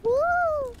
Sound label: awoooo